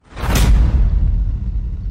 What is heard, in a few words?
deep growl